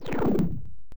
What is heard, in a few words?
Warp; bfxr; FLEETCOM